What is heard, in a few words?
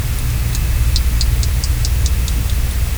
Anoplopoma fimbria Rasp Tick Constant